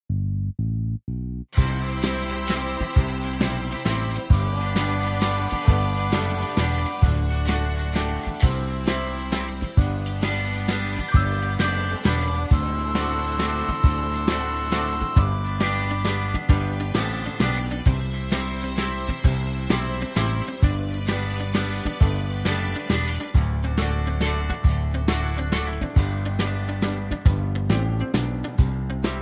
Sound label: (G) No Backing Vocals